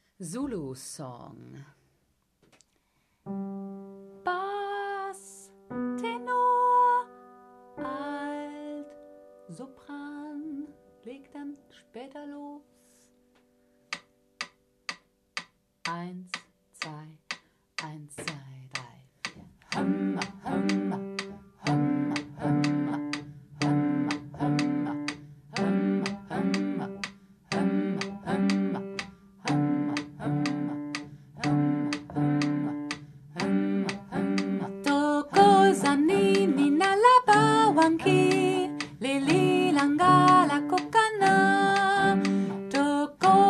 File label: soprano